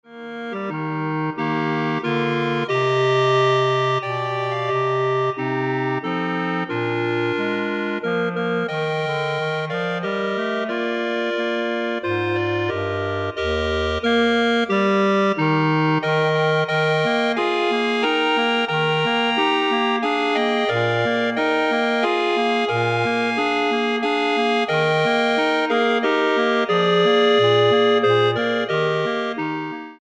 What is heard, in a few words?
Clarinet Ensemble